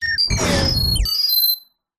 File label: science fiction robot failure glitch shutdown